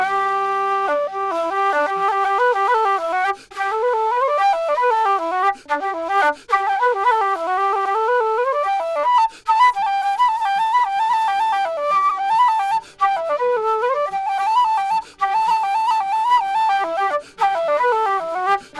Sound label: flute